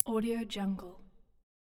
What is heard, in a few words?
nail clippers; stormwaveaudio